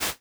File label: rustle